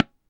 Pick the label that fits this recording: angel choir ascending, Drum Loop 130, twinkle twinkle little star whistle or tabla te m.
tabla te m